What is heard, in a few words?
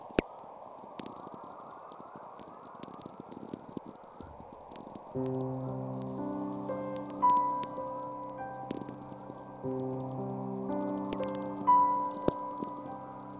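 Aurora Musicalis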